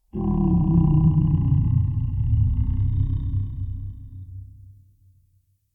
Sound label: Root Cave Ancient Tree Groan; Ambience; Neathuns Root Cave